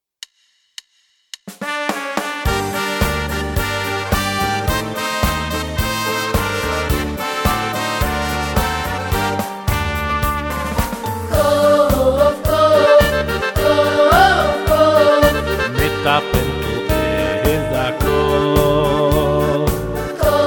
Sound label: niggun